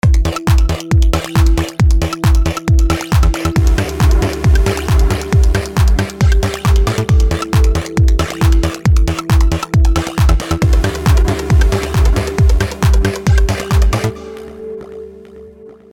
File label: classic trance